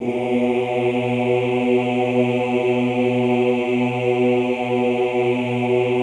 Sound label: OOH B1, Classical Choir; Best Service; Extended Classical Choir